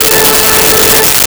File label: Pipe Organ A5; Nintendo; July 2020 Gigaleak